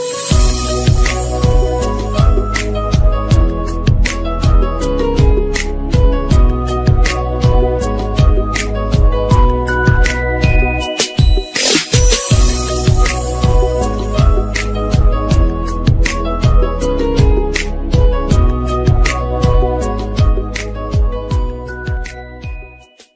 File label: new hindi love music ringtone, bollywood